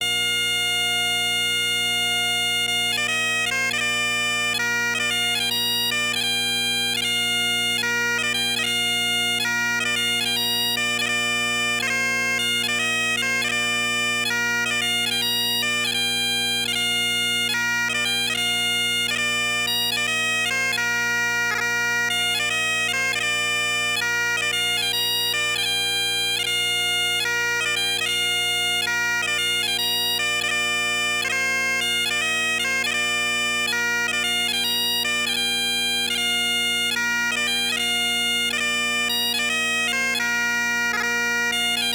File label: Meall Gorm Drones, pipe band